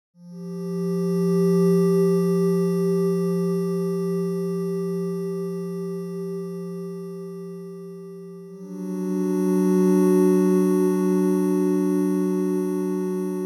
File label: Starting Island; inscryption